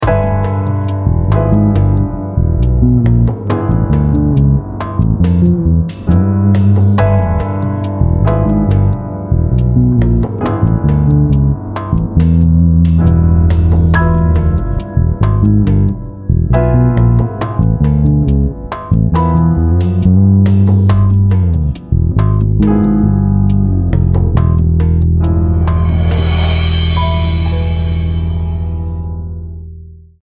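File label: Symmetric diminished half whole groove, bass; a bassists guide to modes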